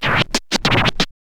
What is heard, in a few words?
scratch short, Vinyl scratches